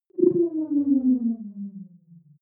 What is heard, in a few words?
wobble drop high